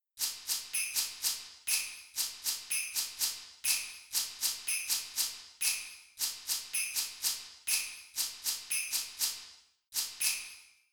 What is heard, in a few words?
Percussion